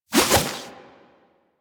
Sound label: Alchemy Lab Add Mineral To Meal Throw Whoosh; Warframe; Hub; Liset Alchemy Lab